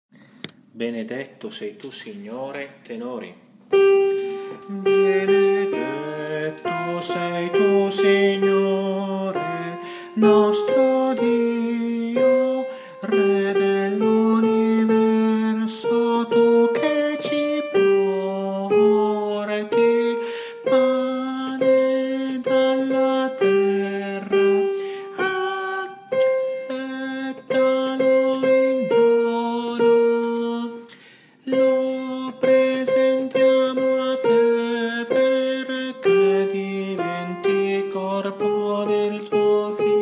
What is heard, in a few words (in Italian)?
Tenori; Benedetto Sei Tu signore